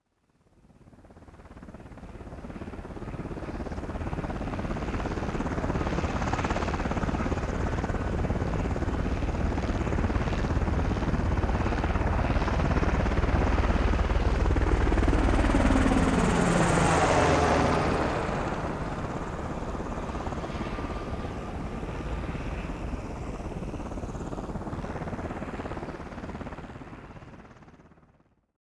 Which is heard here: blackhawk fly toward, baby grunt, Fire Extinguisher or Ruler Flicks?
blackhawk fly toward